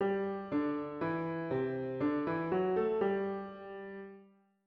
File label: melodic dictation